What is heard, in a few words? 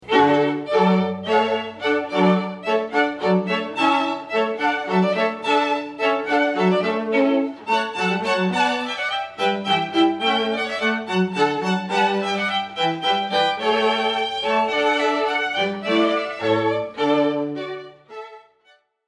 Hornpipe